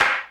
clap; CLAP HIP; Dance Mega Drums; Best Service

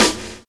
OPEN FIRE; Univers Sons - Kitch 70s

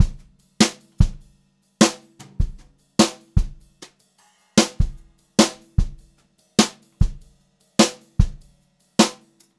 JZ VARI, Brushed Drum Loops, JAZZY

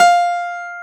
STACK PIANO; AKAI S6000 CD-ROM - Volume 1

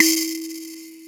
SANZA 3 E3; AKAI S-Series CD-ROM Sound Library VOL-5

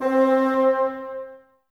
Bassoons C 3; Miroslav Vitous - Woodwind Brass Ensemble

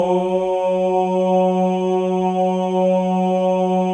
MALE OHS; Spectrasonics; Symphony of Voices

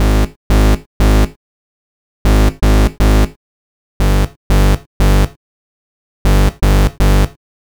120 Bass; Zero-G; Soundclash Nu Dance Electro; Combi Loops; 120bpm; Nu Rhythmix

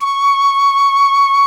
C#4 PICCOLO; Northstar; New Gold VOL-2

